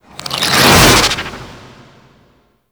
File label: Infested Collar Proj B; Warframe; Infested Alad V